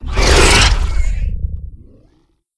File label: hit; sinfar haks; sf effects